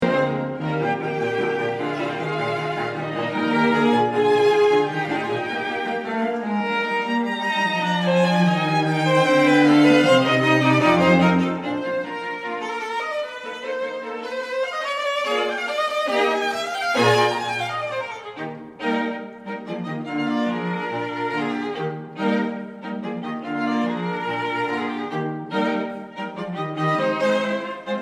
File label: String Quartet